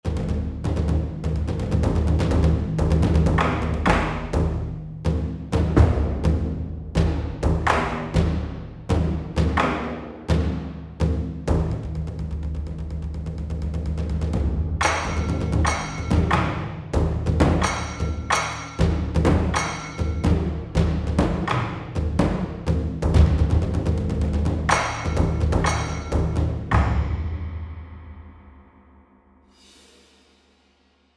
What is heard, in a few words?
Taiko